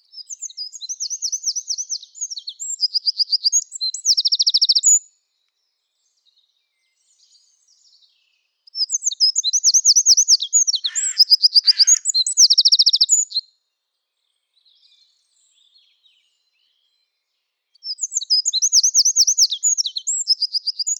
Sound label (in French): Troglodyte mignon Troglodytes troglodytes Chant typique 3 trilles dans chaque phrase ici